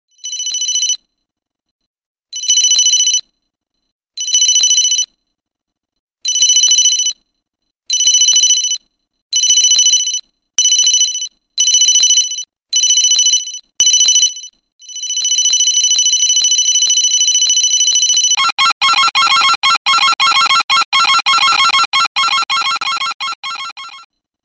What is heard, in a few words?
alarm